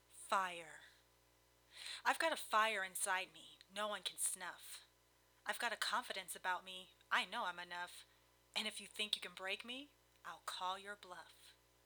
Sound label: Fire